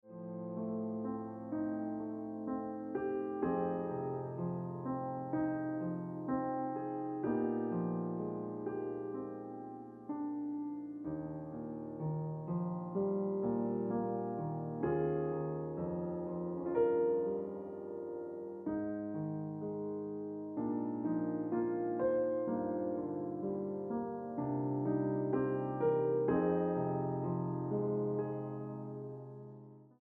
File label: Ringtone Piano Music